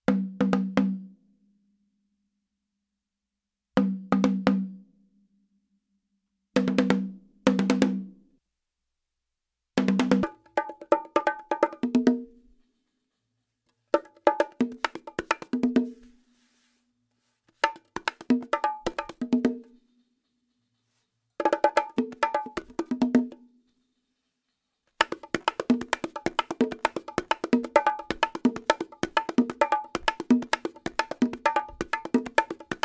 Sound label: Epic